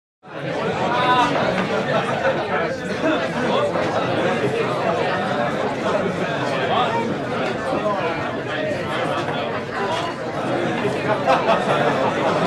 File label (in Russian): irlandskij veselye